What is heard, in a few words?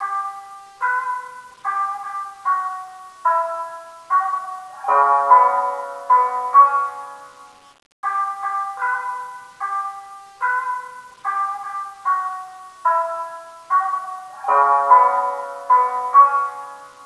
shamisen; yakuza ryu ga gotoku kiwami 2 pc gamerip